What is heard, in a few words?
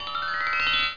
crystalizing; Shards 1.2